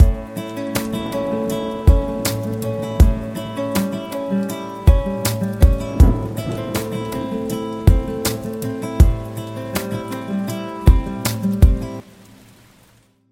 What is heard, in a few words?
4-4 Arp 80bpm with Rhythm, nylon